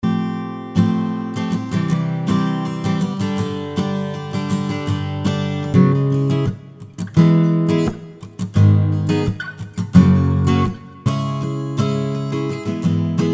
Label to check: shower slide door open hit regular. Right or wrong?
Wrong